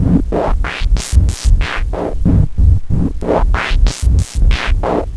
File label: Spectral LFO; Sony Creative Software; Hip-Hop Street Beats Construction Kit; CyclotronicResonator